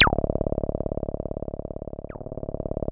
C1, synth; 90 s Sample CDs; NiceBeats; SynthLine VOL-29

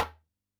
DJEMBE, Yamaha DD-65

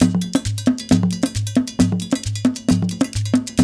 JU JU, African Contemporary; Spectrasonics; Supreme Beats

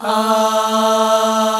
POP AHHS

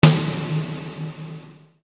Snare Dr

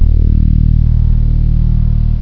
fretless; jazz jackrabbit 2; M8

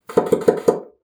BASSOON; Xsample VOL-6

